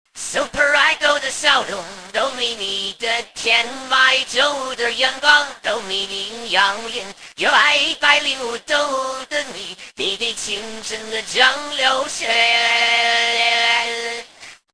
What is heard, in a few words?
Vocals